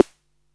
SD, Electro-Harmonix DRM-32

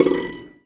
Prepared Piano; Battery 3 Sample Kits

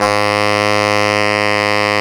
SAX TENOR F, SAX Tenor ff

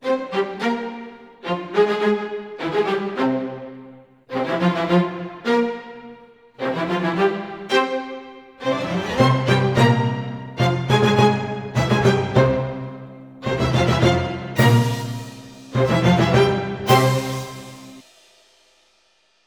105 f min full mix, orchestral arrangements

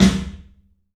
CLUB SNARE; 3D Sound; 3D Drums

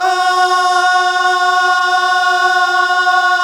GOSP. AAAHH

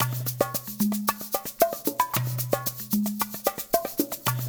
LOOP 3 L, Percussion; InVision Interactive; GRUNT

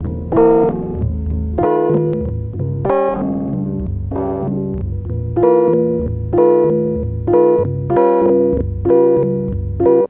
Weird Synth

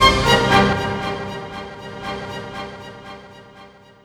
ORCHFX, orchestra, Asian Traditions

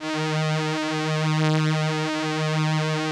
VP-330, String Machines

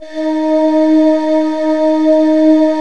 SPACY VOC D#3

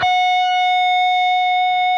PRS G, Guitars, LEAD; Spectrasonics; Hans Zimmer Guitars VOL-1

